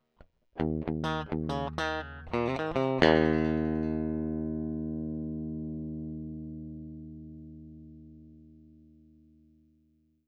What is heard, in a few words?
Crunchy Blues